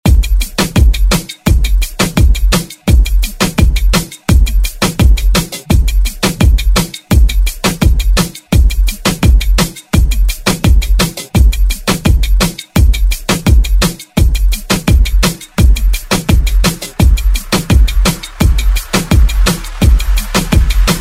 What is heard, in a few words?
Moombahton Redrum